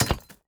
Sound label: mine stone